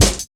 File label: Ezee Rolla Cut, Drum Loops; Zero-G Jungle Warfare 1, 2 & 3